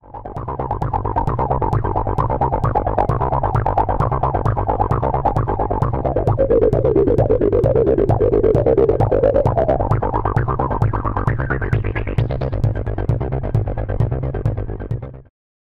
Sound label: Acid Line; Elektron Analog Heat